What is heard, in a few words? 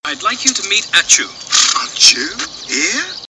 Achoo